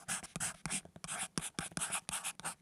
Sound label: Write on Cardboard with Pencil; Office Supplies